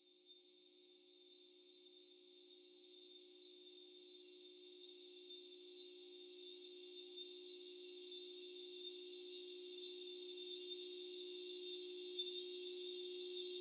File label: Grasshopper, Garden